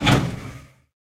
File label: bomb hatch stop